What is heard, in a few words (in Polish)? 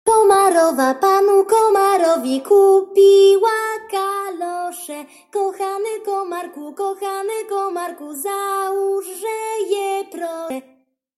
piosenka bez instrumentów